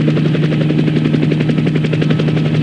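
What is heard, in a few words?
helicopter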